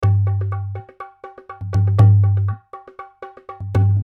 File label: Perc